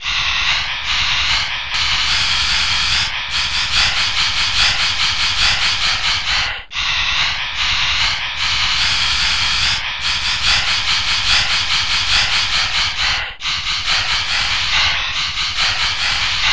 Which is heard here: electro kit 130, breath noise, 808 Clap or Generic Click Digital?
breath noise